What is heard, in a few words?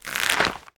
Rope Ladder; moul-assets